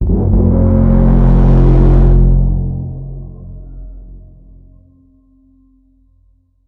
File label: apocalyptic trumpet blast